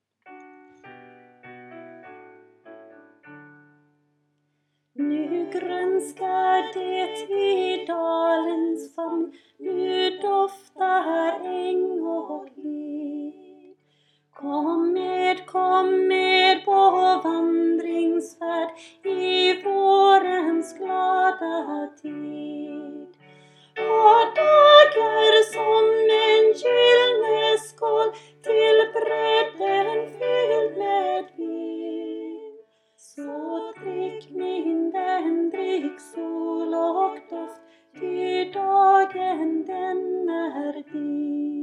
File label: soprano; Nu gronskar det